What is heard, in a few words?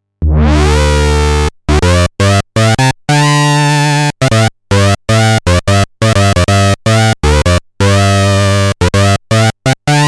Pro-One Sync; SCI